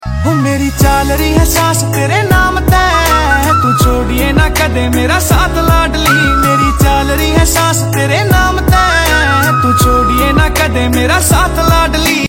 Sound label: Ringtone